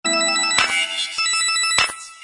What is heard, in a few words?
Notification Nokia